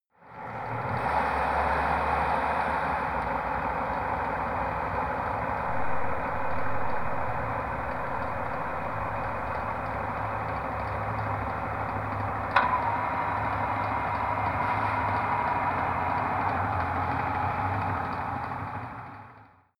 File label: harbour